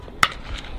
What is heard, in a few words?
cricket bat hitting sound